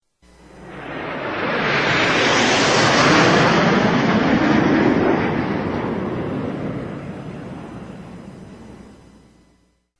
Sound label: 3d sms sound ringtone